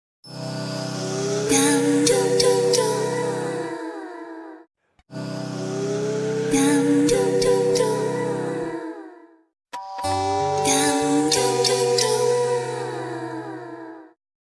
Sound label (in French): jingle pub; france2